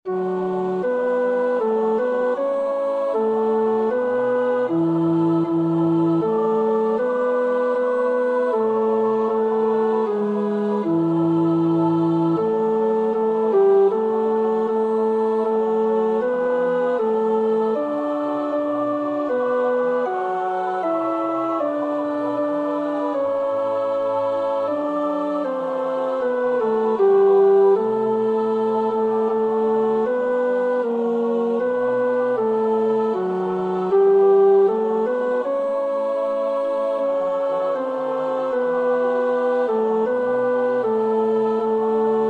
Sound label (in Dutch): tenor, koorpartij oefening; Reger (Max); Auferstanden, auferstanden